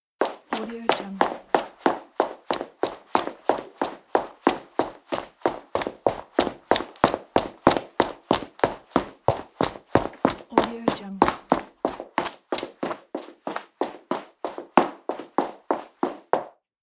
running footsteps on concrete

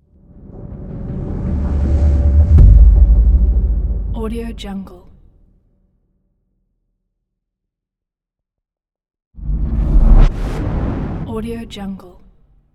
low swoosh

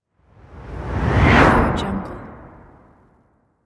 slow slide

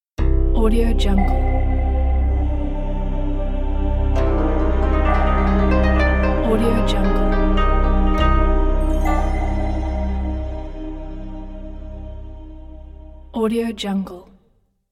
japanese koto logo; audiostudioshe

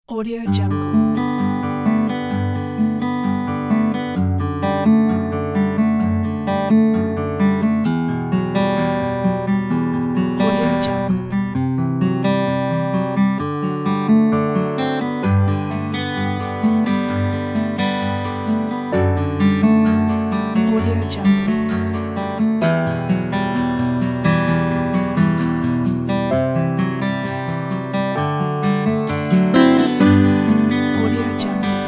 soft guitars folk tune

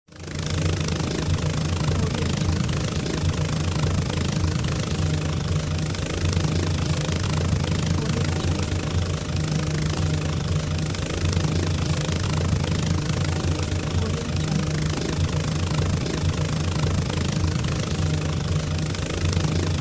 toy helicopter

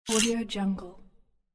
camera shutter

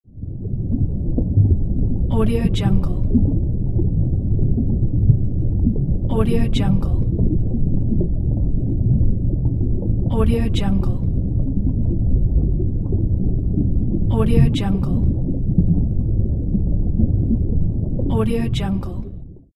underwater; debol757

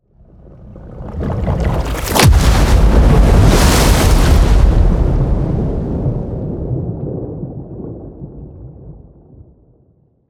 water large explosion; soundmorph

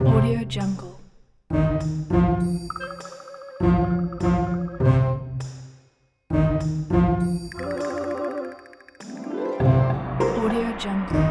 cartoon; whitemonkeystudio

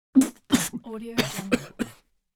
choked

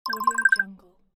loading

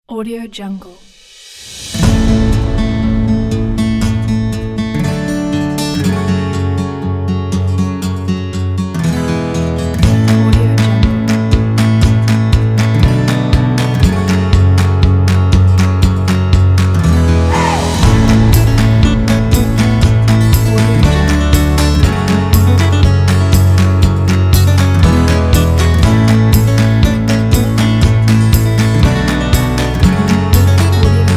country

